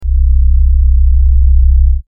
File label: Low hum; Sound FX